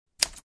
Smith Corona 1940 vintage typewriter single letter